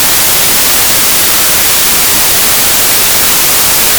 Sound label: whitenoise